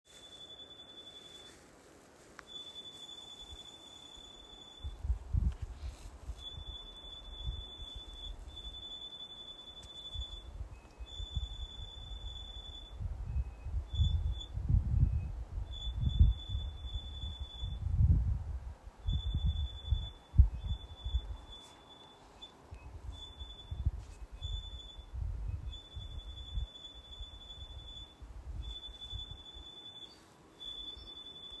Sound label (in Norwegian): ukjent fugl; hovda 7.sept 2024